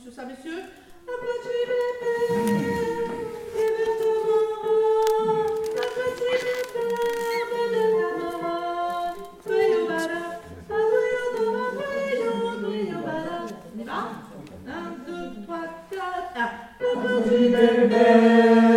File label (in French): début hommes, chorale